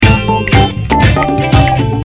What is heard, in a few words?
Creating A Buzz